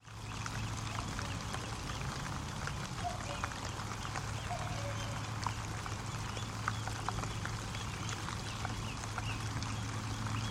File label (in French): Coucou Reveil; field-recordings